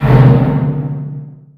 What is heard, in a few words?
Menu Horror